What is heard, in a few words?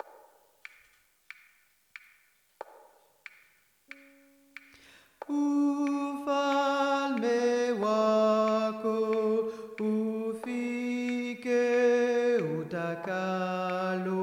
tenors